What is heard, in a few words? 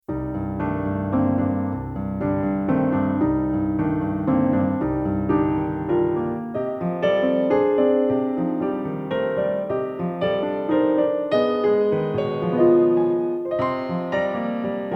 PIANO; UNDERSEA STAGE PIANO ARR